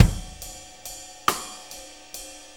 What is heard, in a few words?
6-8 Loop, Full Kit Loops; Drum Tools